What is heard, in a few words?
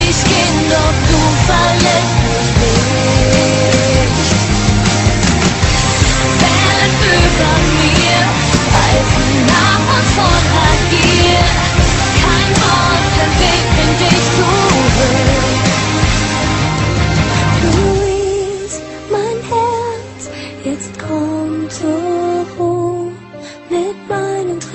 new best german ringtone